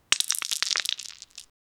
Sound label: dice finishing a roll